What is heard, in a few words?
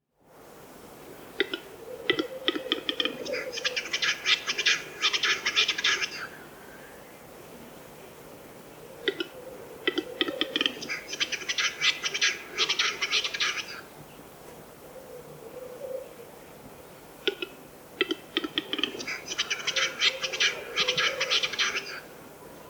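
gluhar golos, capercaillie voice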